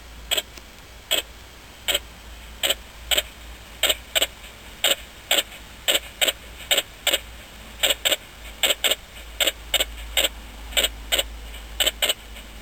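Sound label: Tessellana veyseli, Wienfluss; WReitmeier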